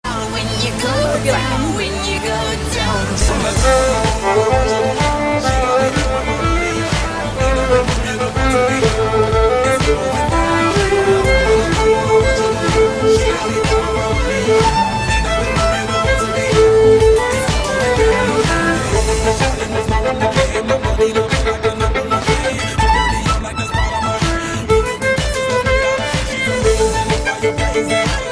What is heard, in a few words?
hip hop violin